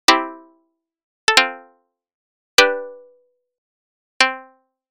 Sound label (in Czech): Akordy C dur